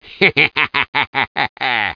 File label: Laugh; AQ2